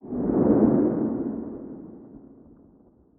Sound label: gamb exit; gamble; Butterfly Lovers; wazdan